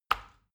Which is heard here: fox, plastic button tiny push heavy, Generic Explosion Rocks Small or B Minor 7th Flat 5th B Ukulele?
plastic button tiny push heavy